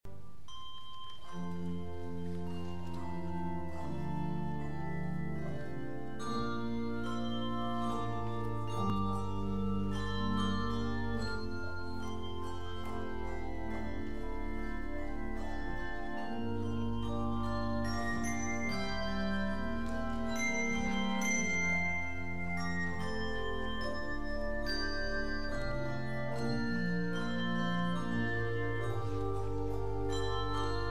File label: Mass Choir with Handbells; Away in a Manger